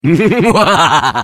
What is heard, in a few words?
laugh; Defective Turret; sp sabotage factory